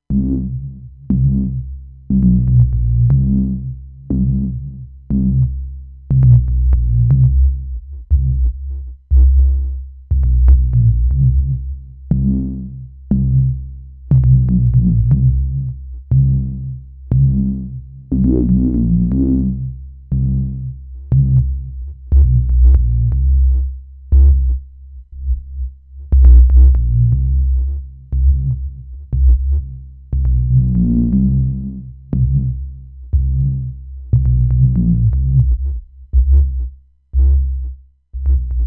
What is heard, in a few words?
Low Bass